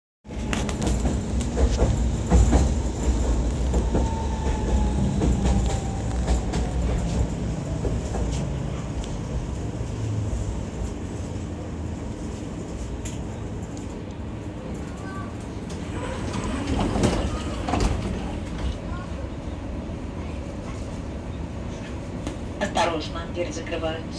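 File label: tram sounds announcements inside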